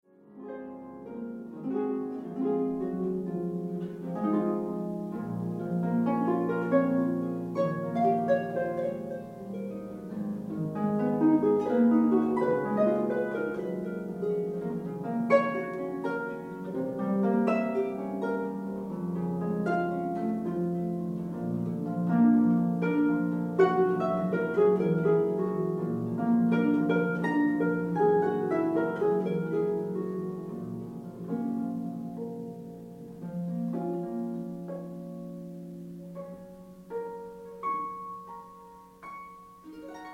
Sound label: Impromptu, harp